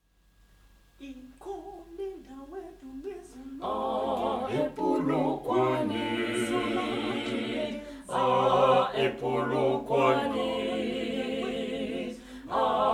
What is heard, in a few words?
Ikhoni Ndawo Alto tenor and bass